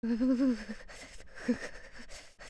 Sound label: Young Shiver; Link shivering